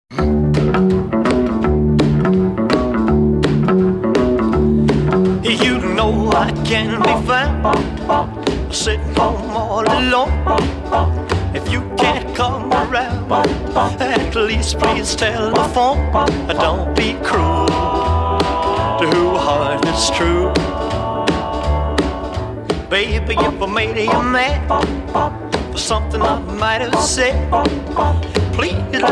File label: narrow